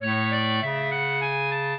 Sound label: clarinet; Mozart